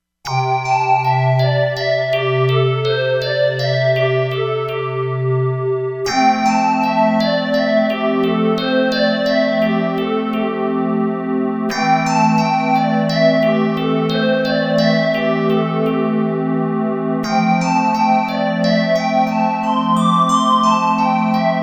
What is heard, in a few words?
Tweety, Korg MSC-07S Synth; Korg MSC-07S Synth 2